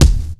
acoustic kick single shot d sharp key; kick drum

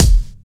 bright kick drum sample e key; tuned drums e key

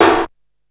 old school ambient steel snare drum f sharp key

